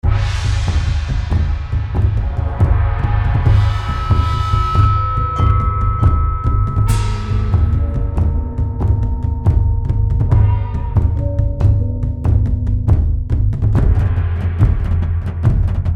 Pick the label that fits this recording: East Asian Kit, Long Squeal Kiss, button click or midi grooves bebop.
East Asian Kit